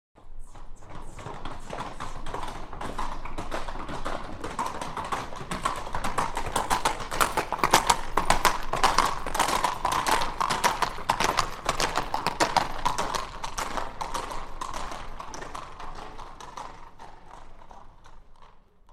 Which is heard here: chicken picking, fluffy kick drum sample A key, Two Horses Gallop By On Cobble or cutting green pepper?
Two Horses Gallop By On Cobble